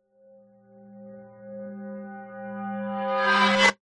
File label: metal chime hit reverse build crescendo; sound design